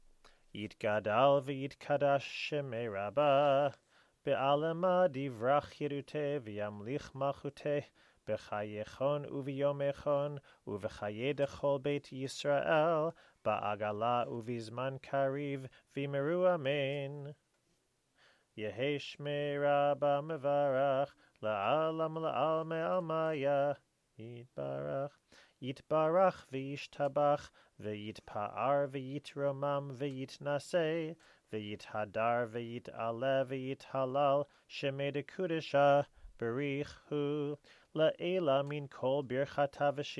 full kaddish

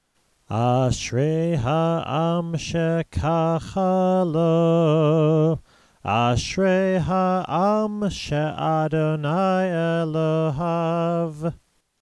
ashrei

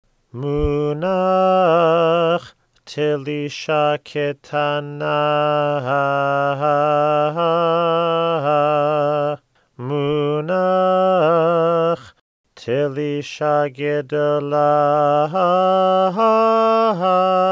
Torah Trope Telisha Pazer

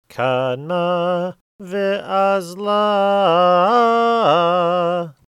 Haftarah Trope Kadma Veazla

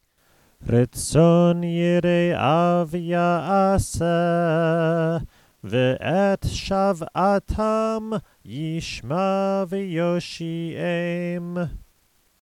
ashrei

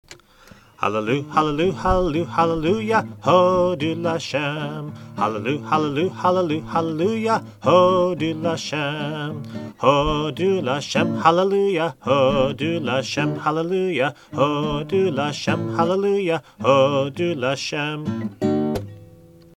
Hallu halluya Hodu Lashem

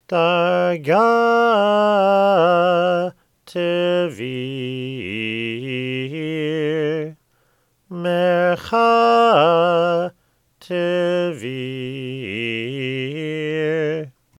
Torah Trope Haftarah Darga Tevir

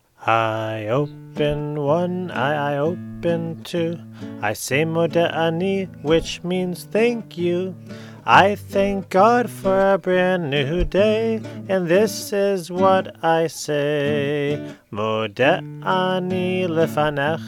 Modeh Ani with I Open One Eye

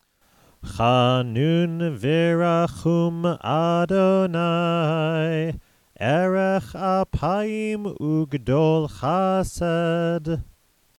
ashrei

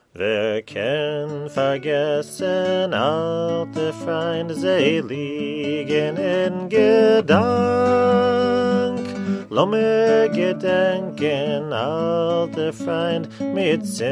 Auld Lang Syne Yiddish

